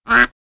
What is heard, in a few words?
Duck quack single call; bird